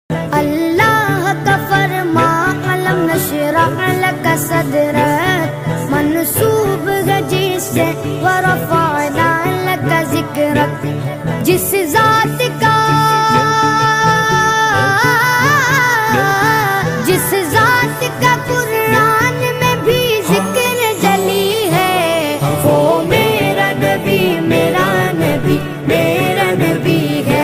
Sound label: islamic ringtone